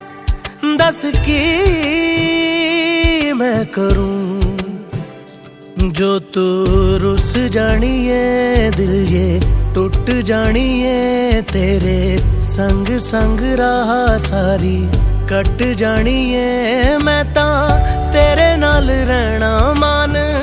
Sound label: bollywood ringtone